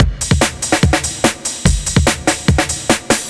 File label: drums, Hardcore Breakbeat, 145, Ebmin; Big Fish Audio; Hardcore Breakbeat Action; Riddim